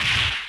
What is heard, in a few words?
Attack; RedDot